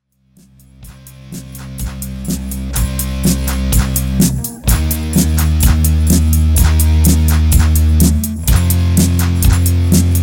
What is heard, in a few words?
Disco, dickes blech